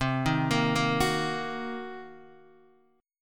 C Minor 7th Flat 5th Guitar Open D, chord

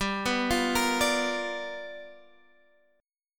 G 7th Sharp 9th G chord

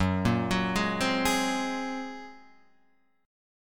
A sharp 7th Suspended 2nd Sharp 5th

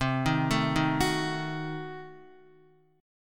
C Diminished 7th C, Guitar 4F,4A,5C,5G,5C,6E; arpeggiated; JGuitar

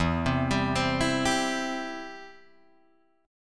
E Minor Sharp 5th E down Guitar Open G

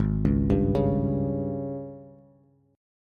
Ab Major 7th Suspended 4th Ab down Bass; arpeggiated; JGuitar

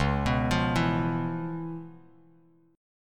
Db 7th Sharp 9th Db, down, Bass Standard; arpeggiated; JGuitar

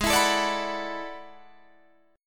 E 11th Ab

